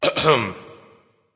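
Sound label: nonverbal clear throat; smite; voicepack; ullr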